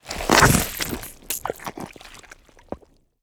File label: creature texture mud; Bluezone Corporation; Organic